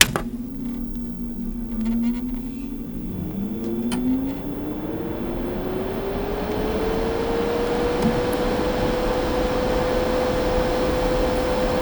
Printer Start Warm Up

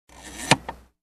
Closing snap sound

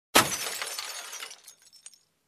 Broken Glass

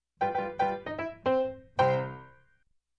Funny Piano Ending

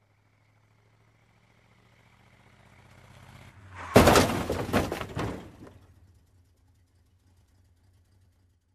Approaching Car Crash

